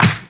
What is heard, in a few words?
Pre-Shifted Claps; Vengeance Samples